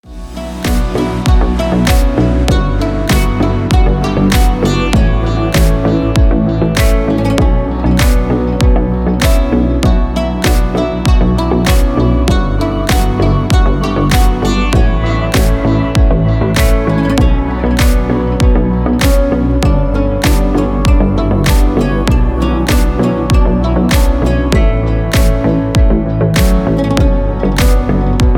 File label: call sound